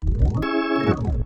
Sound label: Stabz